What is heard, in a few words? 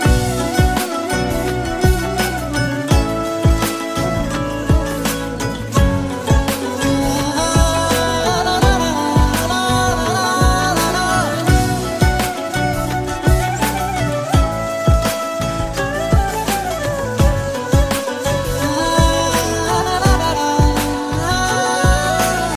punjabi music ringtone